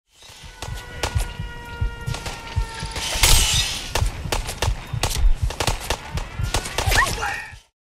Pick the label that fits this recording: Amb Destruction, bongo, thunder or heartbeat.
heartbeat